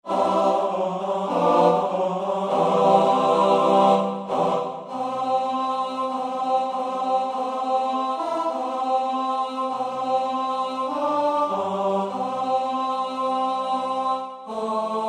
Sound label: tenor TTBB